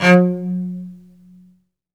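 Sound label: SOLO cello, Strings; ILIO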